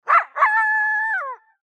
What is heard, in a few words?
coyote yip howl dry